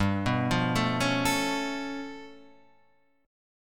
G 7th Sharp 11th, chord; G7#11 arpeggiated; JGuitar

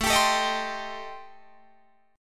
A-Minor 7th Flat 5th, Guitar Drop D, chord

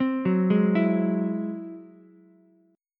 Ab Augmented 7th Ab down Ukulele; arpeggiated; Ab+7; JGuitar

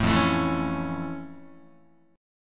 F Augmented Major 7th, Guitar; strummed; down; 4A,4E,5A,5D,5Gsharp,6Csharp

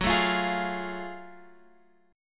F sharp Minor Major 9th F sharp

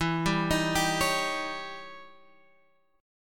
E Minor Major 13th E Guitar Open G

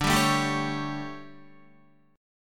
D Major 7th Suspended 4th, D, down, Guitar; strummed

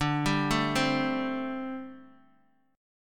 D 7th Suspended 4th, down, Guitar, DADGAD

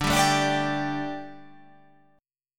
D Suspended 4th D, down

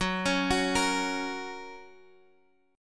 F sharp Major, Guitar Half Step Down

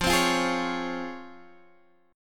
C sharp Major 7th

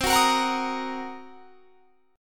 C Minor 7th Flat 5th, chord; Cm7b5; strummed

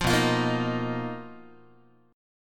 B Major 7th Flat 5th B down Guitar; BM7b5; strummed; JGuitar

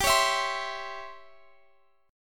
C sharp Minor Major 7th Flat 5th G

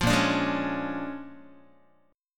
Bb 7th Sharp 9th Bb, Guitar; Bb7#9; strummed; JGuitar

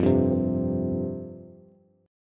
F Suspended 2nd F Bass; Fsus2 strummed; JGuitar

